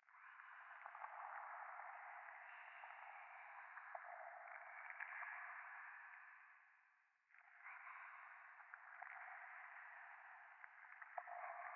左耳密着処女喘ぎ 触手

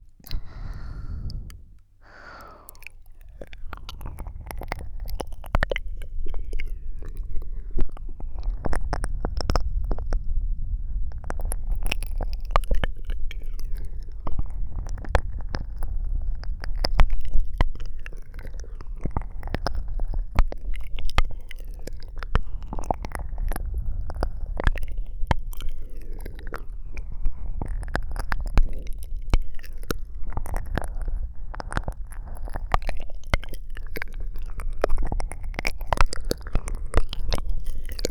ゆっくりおまかせコース

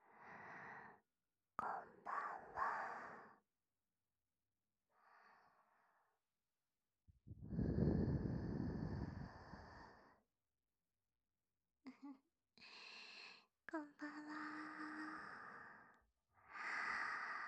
たっぷりふぅふぅ吐息＆耳舐め

